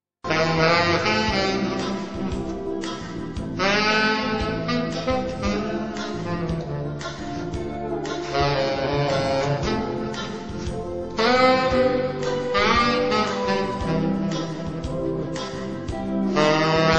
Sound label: Tenor Sax